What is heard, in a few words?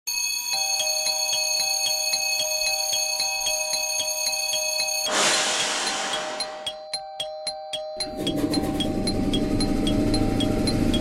rail example ats